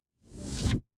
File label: transition riser